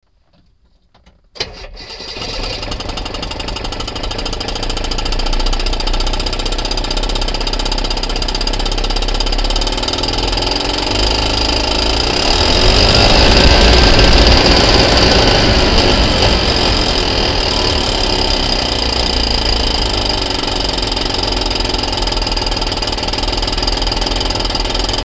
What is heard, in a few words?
Subaru 360 startup